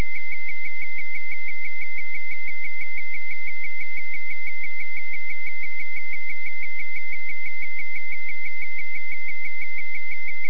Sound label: rtty diddles